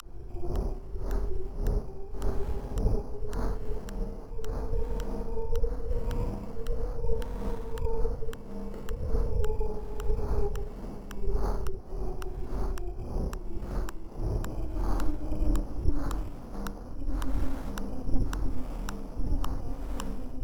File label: Cm72 Zone Ambience